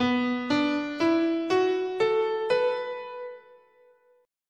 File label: B Minor Pentatonic